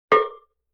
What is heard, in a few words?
Cowbell Low Damp; Vintage Cartoon; Drums & Percussion